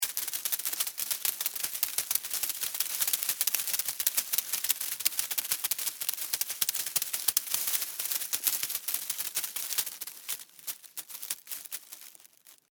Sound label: Insect Walk Leaves, Insect, Walk, Leaves, Foliage, Fast, Movement, Bug; Blastwave; Animals:Insects